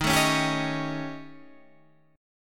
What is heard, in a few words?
D sharp 7th Flat 5th, D sharp, down; strummed; JGuitar